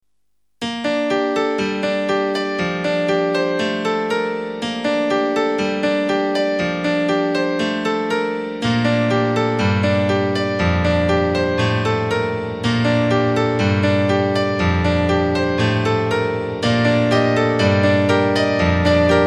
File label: Interlude